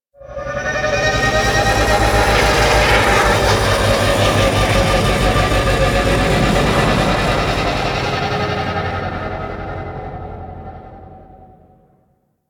Drop Off, Vehicles; Nora Liset; Liset Flight

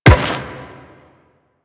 Run Metal, Footsteps

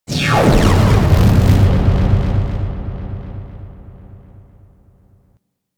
Turn Off Void; Warframe; Ambience; Tutorial

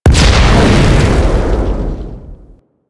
Generic Explosion; Explosions; Warframe

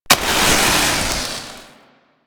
Proto Infested Maggot Spark Start

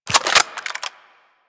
Reload, Railgun; Lanka

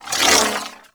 Proj Whiz; Enemies; Sentient Dolicholyst

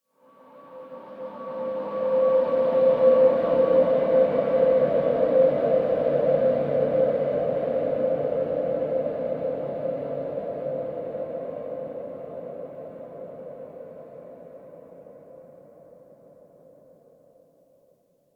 Exterior Texture, Ambience; Warframe; Rail Jack

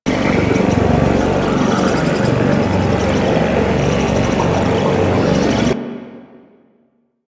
Computer Station Ambient Loop; Warframe; Ambience; Large Cargo Room

